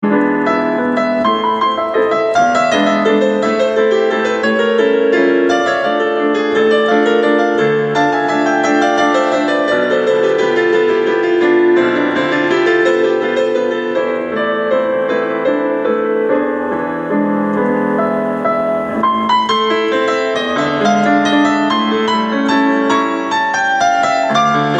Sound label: halloween piano noodling